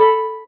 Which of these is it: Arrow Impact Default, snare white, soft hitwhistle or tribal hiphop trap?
soft hitwhistle